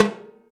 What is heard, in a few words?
Timbales Hand, Roland BK-5; Percussion